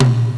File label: toms; Star Trek demo; Star Trek Audio